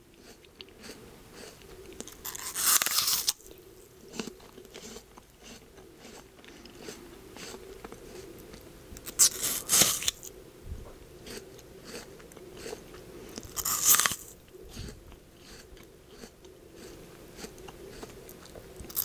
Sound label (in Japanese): 左耳 女性がリンゴを咀嚼する音ASMR; 快感ASMR～両耳でリンゴを咀嚼する音(素人女性フェチ音)